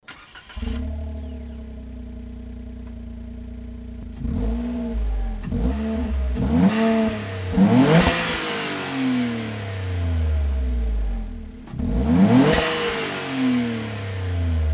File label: alto works ha36s; fujitsubo; hks150